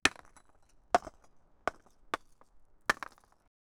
mined cobblestone

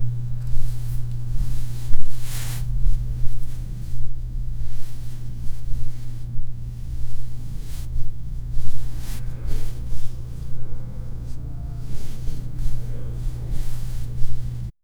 gently touching skin

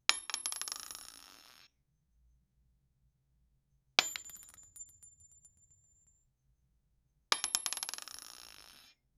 bowling pin falling

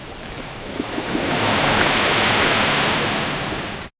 clifftop power walk

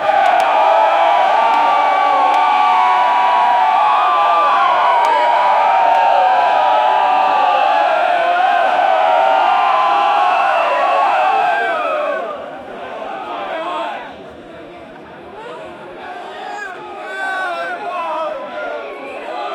crowd reaction to stage, music venue

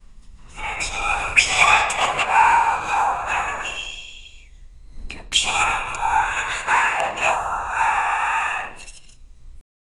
evil creature whispers

